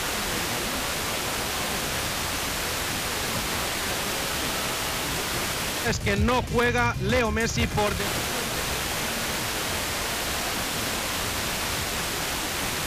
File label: 105.4 MHz; madrid; rds and meteorscatter